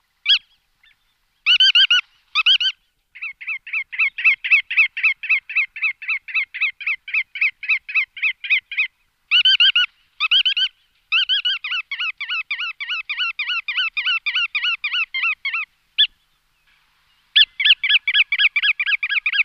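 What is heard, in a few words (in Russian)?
malyy veretennik golos, bar-tailed godwit voice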